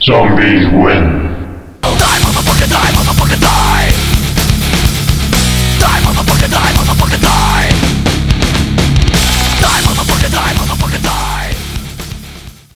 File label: zm win